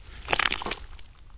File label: foley, footsteps; physics; undying